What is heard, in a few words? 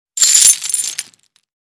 Old rusty chains rattles and clanks